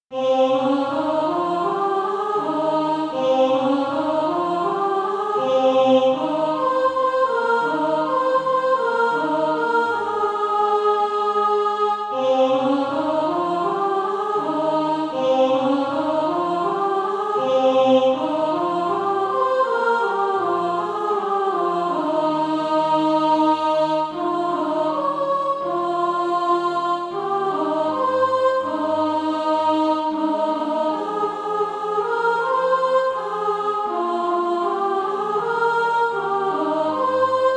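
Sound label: soprano, SATB Practice Music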